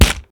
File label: hit; midnight guns